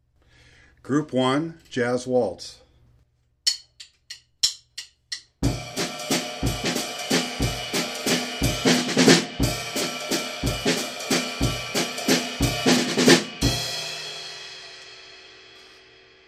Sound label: jazz waltz